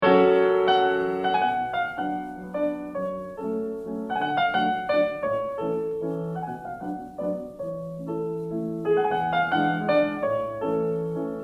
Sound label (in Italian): Chopin mazurka op 30 n 2